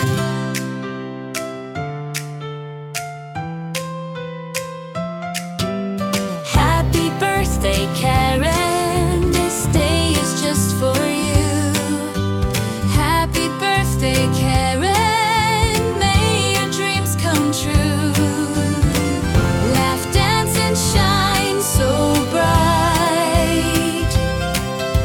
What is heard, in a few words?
Happy Birthday Song